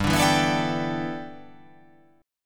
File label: G 6th Add 9th G, chord